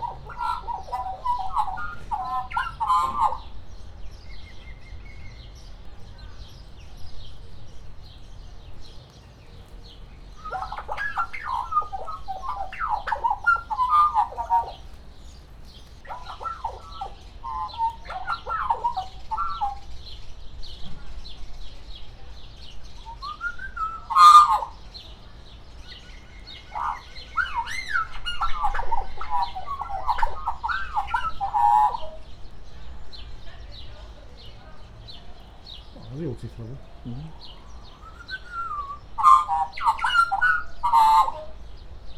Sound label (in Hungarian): párbeszédbe elegyedve a madárral, feketehátú fuvolázómadár; debreceni zoo 2012